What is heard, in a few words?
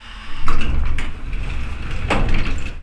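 elevator door; InVision Interactive; The Piano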